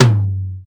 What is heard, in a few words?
verby tom one shot G key, Royality free tom drum single hit tuned to the G note